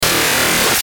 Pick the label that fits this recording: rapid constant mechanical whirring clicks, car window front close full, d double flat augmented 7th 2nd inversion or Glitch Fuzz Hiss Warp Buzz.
Glitch Fuzz Hiss Warp Buzz